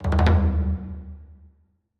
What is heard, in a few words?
Percussion Transition; Draugr Stems; song of iron